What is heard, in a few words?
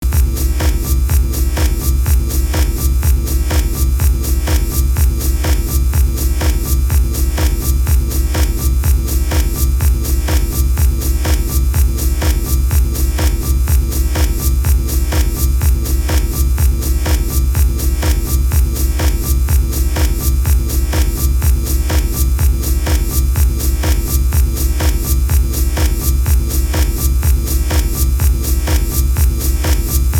Power Station